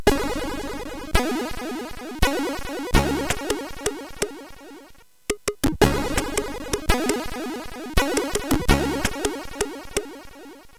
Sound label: Relaxing, CSG8580R5; hvsc